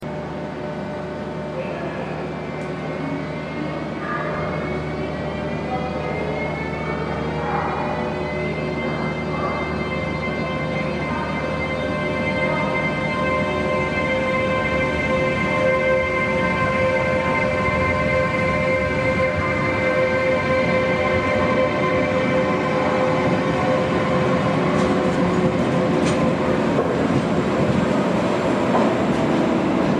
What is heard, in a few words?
Siemens Eurosprinter